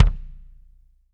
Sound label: BD, Drums; Bob Clearmountain Drums I